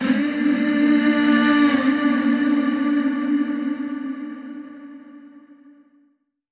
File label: Serendipity Vocal Hums